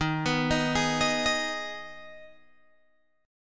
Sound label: Eb Suspended 4th Sharp 5th, Guitar, Open G